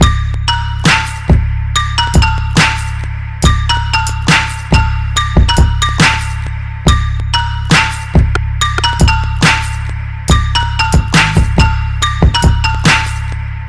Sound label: Trap